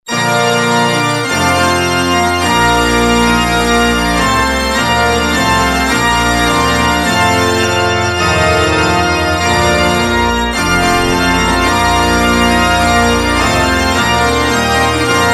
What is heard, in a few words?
trumpet voluntary; purcell